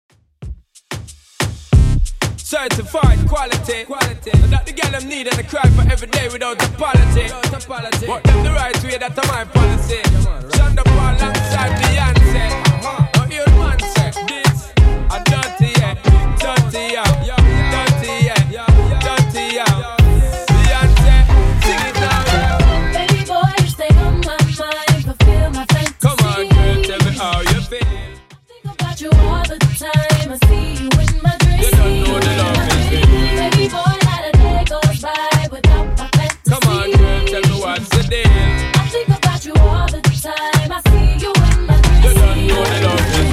Throwback Rnb Redrum 92bpm

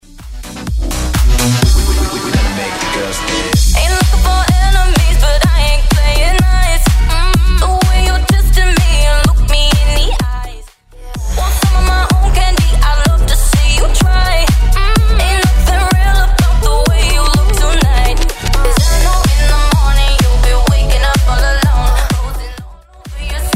Vocal House 126bpm

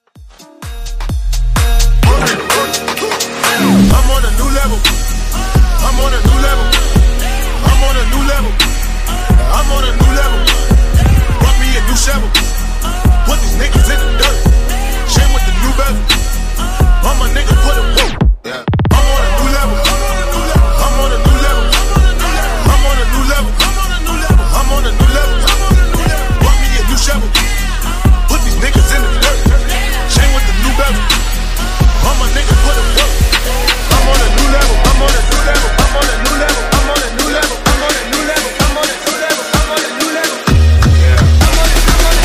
Bass House

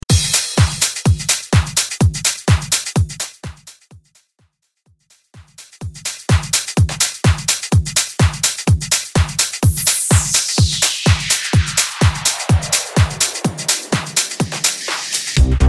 R B Club Mix 125 105 Bpm; Mainstream VIP; Transition; Vegas Edit

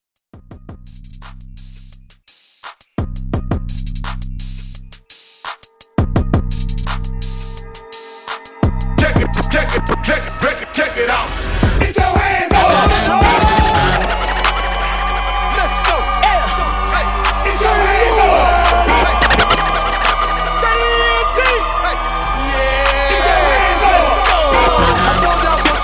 Old Skool, 85 Bpm; ReDrum

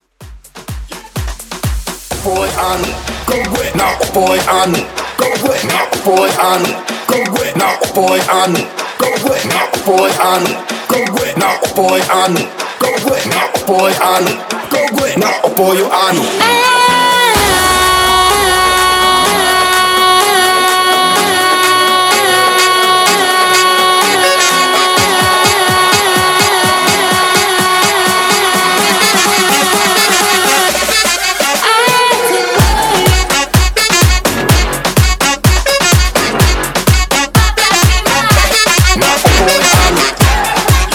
Trompa Club Tribal Dance 126 BPM; Short Cut

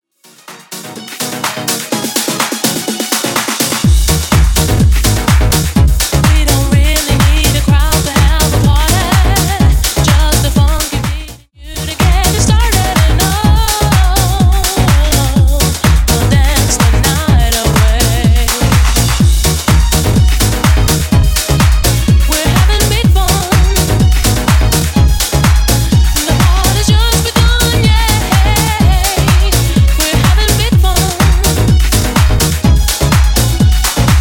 Re Drum 125bpm

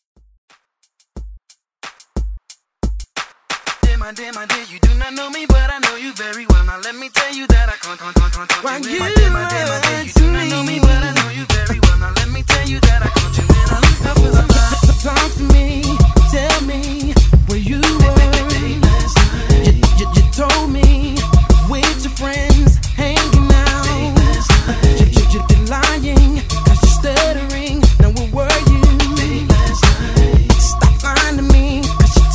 Throwback Redrum

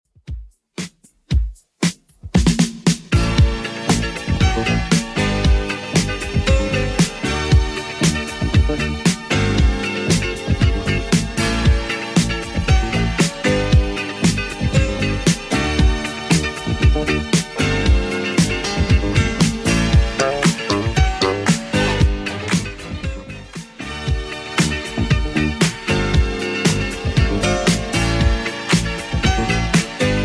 70s Redrum 116bpm

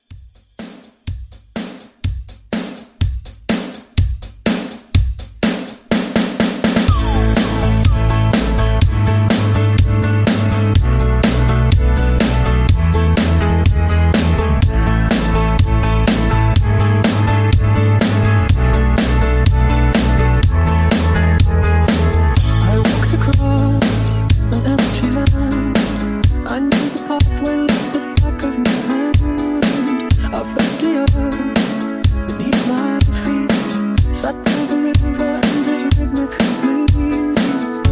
Alternative Rock 124; Re-Drum

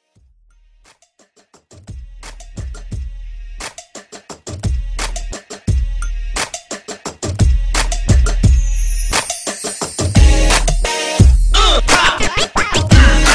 Scratch Mix

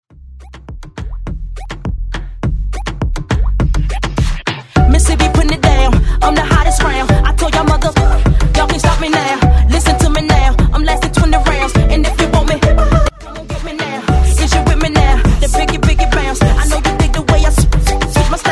Moombah, 6A, 103 BPM

